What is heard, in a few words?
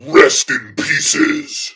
GAME OVER; Death Goat; REST IN PIECES